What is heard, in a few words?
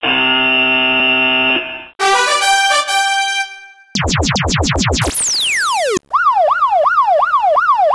buzzers